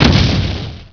explo, 爆炸; 爆炸系列声音素材

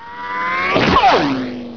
RICO, 枪炮声音素材

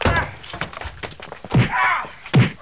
fight; 欢乐运动声音素材

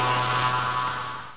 HUM, 机器

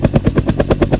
CHOPPER, 碰撞声音素材

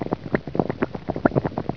RUMBLE

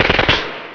UZI; 枪炮声音素材

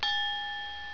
CRYSTAL

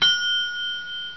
BELL

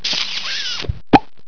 kiss; PPT音效

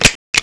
CLICK

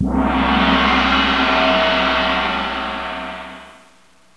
GONG

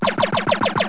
LASER; 激光

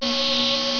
laser

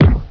THUD, 碰撞声音素材

